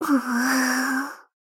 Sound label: section fail; osu skins; sweeb funny mix